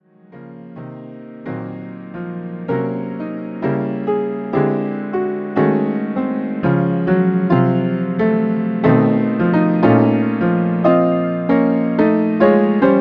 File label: Dalila Aria Piano Solo; Arranged; Camille Saint Saens; Arranged by Dias Karimov